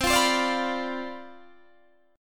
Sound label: C Major 7th Suspended 2nd Suspended 4th C down Guitar Open D, chord